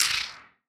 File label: Wider Clap